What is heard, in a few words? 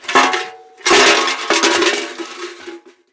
debris, ambience; ambient, ambience, rainscapes, rain; Left 4 Dead 2